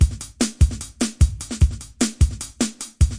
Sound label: 150 flat electronics, drum loop; 141 to 160 bpm